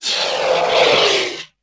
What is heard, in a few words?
scrm; The Elder Scrolls III - Morrowind